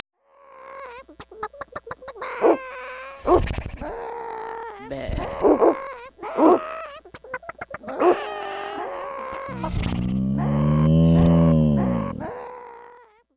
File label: daytime